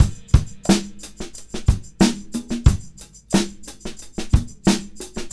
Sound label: Combo, R&B Drums